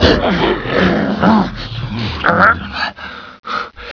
zombie

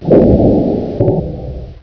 disaster; ambience

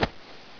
foot; hl2

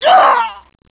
death

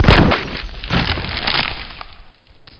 body splat

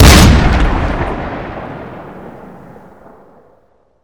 shoot

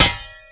nanosword hit wall; ragemap2025; fournines

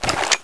colt draw

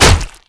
cleaver hit bod, weapons

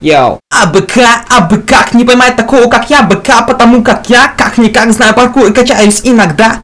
rap

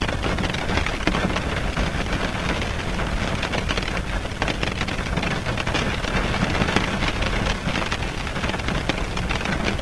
rain garage

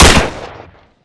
Browning shot, weapons

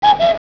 birds kuku; ns wubbit b4

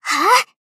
jump; hifumi

